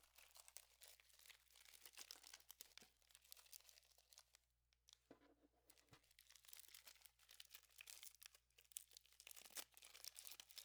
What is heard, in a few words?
Tape Ball; Soundmined